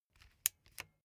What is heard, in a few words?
bicycle street gear control upshift